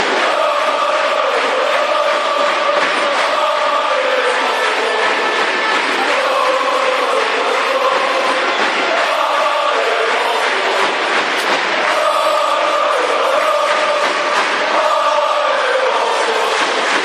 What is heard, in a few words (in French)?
lalalala allez lensois